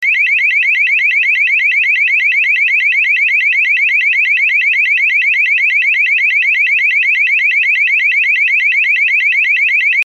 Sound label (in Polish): ton